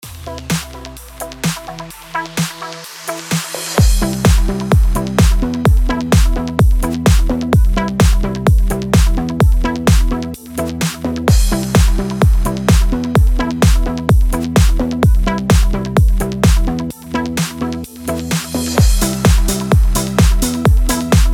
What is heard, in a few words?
ELECTRO EMIN 128BPM